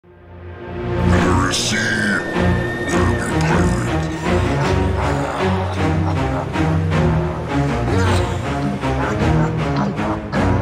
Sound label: PIRATE FUNK slide funk slowed